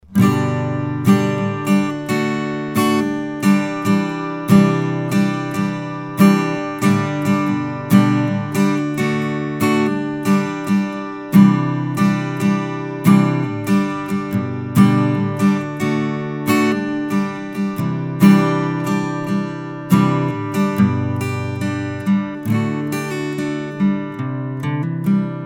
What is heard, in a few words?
Acoustic Guitar Gemini II; mic shootout